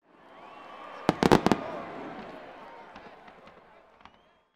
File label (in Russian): prazdnik fejerverk zvukovoj effekt